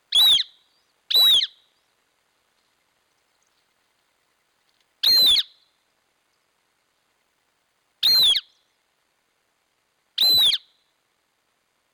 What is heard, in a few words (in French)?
busard saint martin